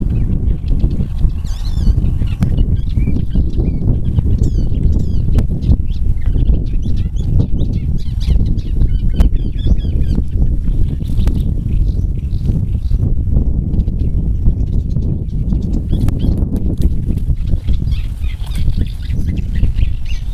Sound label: warbler thick billed Iduna aedon